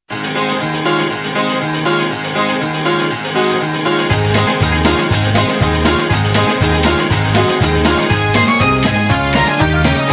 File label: Baroque Hoedown